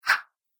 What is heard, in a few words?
Karate Action Swish, combat swing sound effects; Whoosh Sound Effect; Swish, Zoom, Swipe, Whoosh